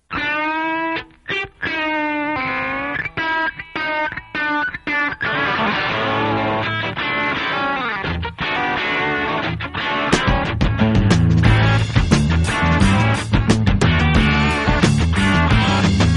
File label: funk, ringtones